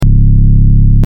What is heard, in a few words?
Synthwave hum